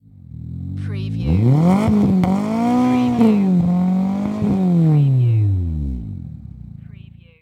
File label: MITSUBISHI ECLIPSE EXHAUST MULTIPLE REVS